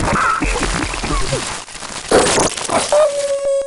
loop, techno atmos

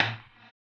HAMBONE, Vocal Blues Soul; Spectrasonics; Planet

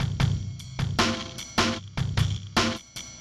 Crunch Junky Loop, Drum Loops Vintage; Zero-G Smoke

